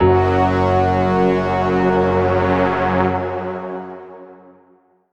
Heroic sting, soundtrack; minecraft story mode gamerip 2016; A Block and a Hard Place

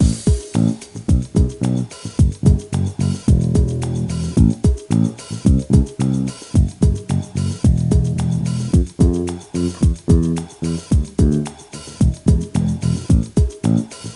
Music Minigames; animal world big cats dinosaurs 2009 nds gamerip

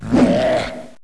attack zombie

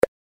Button Tap

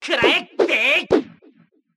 double slash; Mystical Ninja Starring Goemon

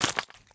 Oil Splash; Smashy Road Arena android ios 2016

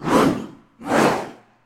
Sound Event War Cry; Peace, Death

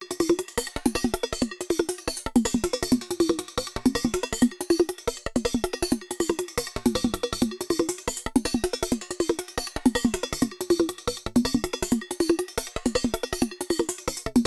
Drum Loop

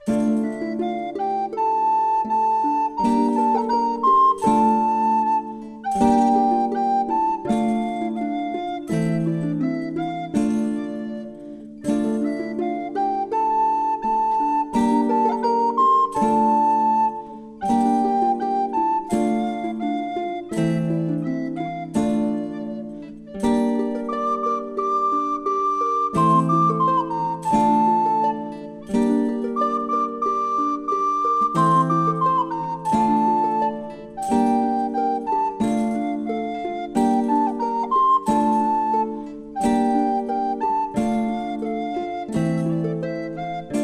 Old tune; anno 1503 gamerip

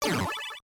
Medium enemy destroyed; Gradius; MSX; enhanced SCC

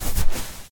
footstep sand walk; Poppy Playtime; ProSoundCollection v1 3

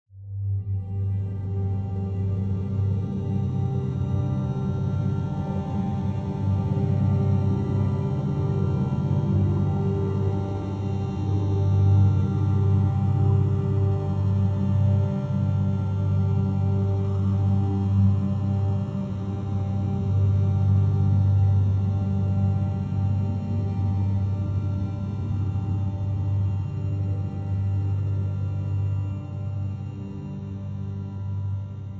Atmospheric Mystery Loop

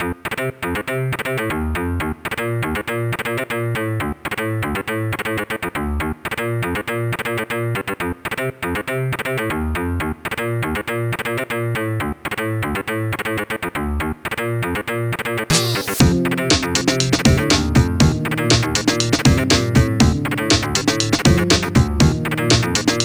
Instrumental; Inserted

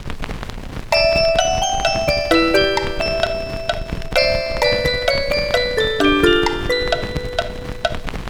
Musicbox; Scratchin' Melodii; Sage 2022 Demo

